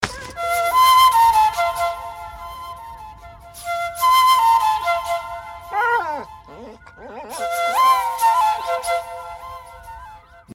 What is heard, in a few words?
flute, ringtone